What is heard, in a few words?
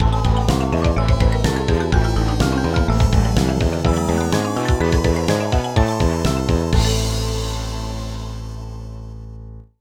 Game Over Jingle Bad; Garfield Gets Real; NDS